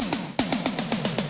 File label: startup